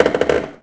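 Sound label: boat creak; envrn; The Elder Scrolls III Morrowind GOTY; XBOX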